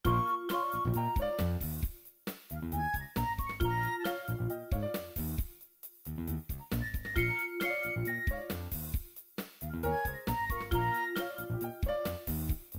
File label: nokia 1110 ringtones; blue ice